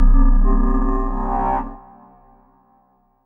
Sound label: RAVE, RAVESYN